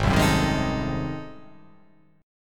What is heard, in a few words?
G sharp 7th Flat 9th G sharp down, chord